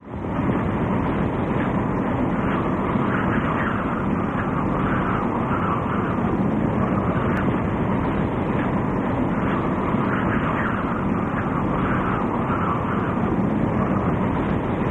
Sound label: SANDSTORM, Gusts; Heavy Ho; sandy desert & gusts